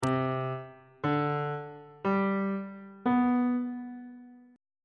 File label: d double flat major 7th suspended 2nd chord intervals on bass clef